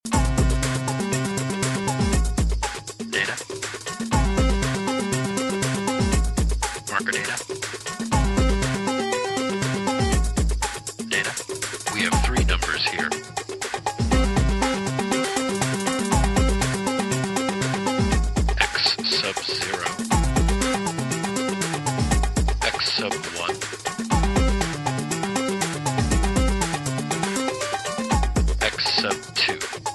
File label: Science Groove ringtone